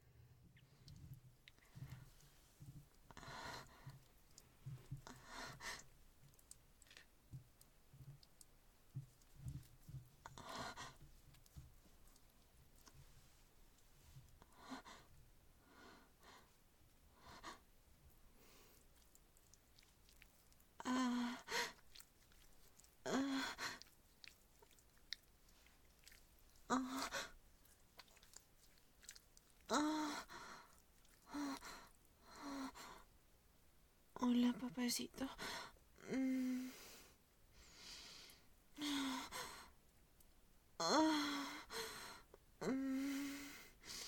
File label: Multiple orgasms, wet noises, F4M